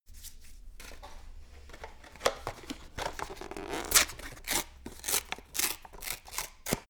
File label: paper rustle and rip